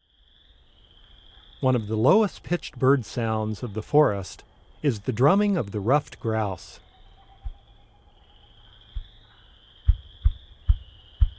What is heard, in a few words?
Ruffed Grouse, Bird Sounds; North America; Common Western Species; Know Your Bird Sounds